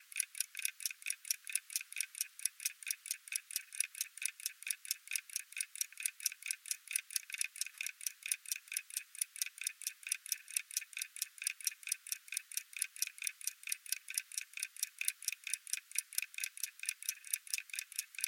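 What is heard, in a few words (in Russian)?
старый механический будильник 1960-х годов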